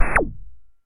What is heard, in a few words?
Video Game Fire Effe